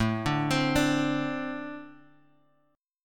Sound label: A-Minor Double Flat 5th A; Ambb5 Chord {5 5 X 5 3 X} arpeggiated